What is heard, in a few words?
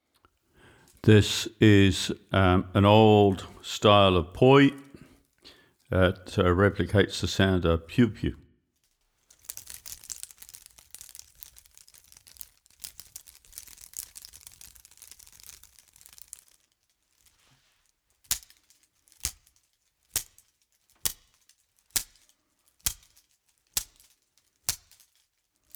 Poi, samples